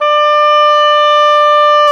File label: oboe, Roland Orchestral Winds